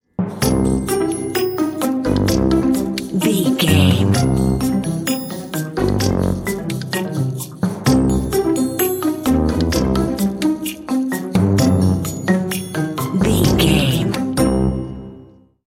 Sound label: silly fun bumper